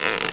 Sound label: bend; Pong